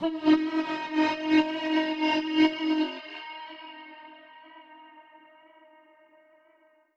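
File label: alarm siren, reverb processed, 170bpm; musicradar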